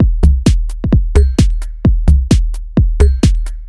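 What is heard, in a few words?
Drum loop 130 Deep easy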